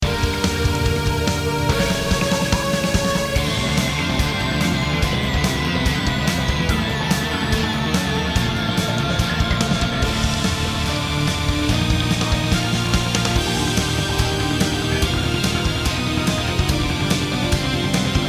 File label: Stage Bonus; BullShot; Stage 3 Bonus